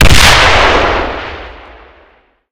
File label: rpg7 explosion, weapons